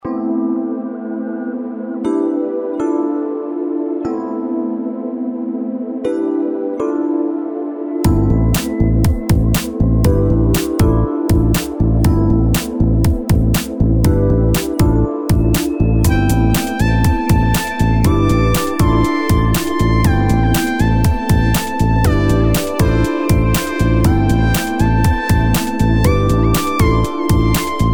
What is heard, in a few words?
chill down